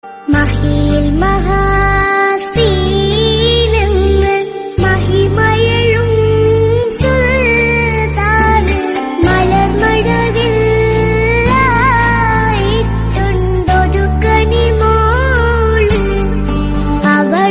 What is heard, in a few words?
Arabic Remix Song